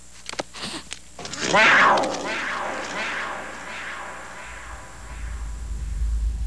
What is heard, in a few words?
Little Roar